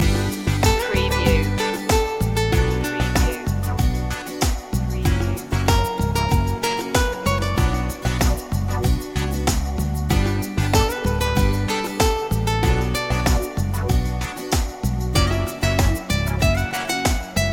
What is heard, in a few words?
love rhythm loop